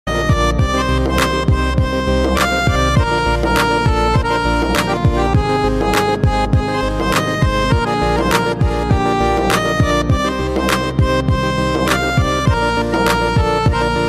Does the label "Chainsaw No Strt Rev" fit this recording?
No